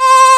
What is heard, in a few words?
SOLO STRG C3, Strings; POP STRINGS